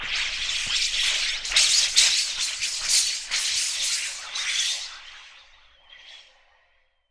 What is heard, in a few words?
SHEET METAL, Definitive Percussion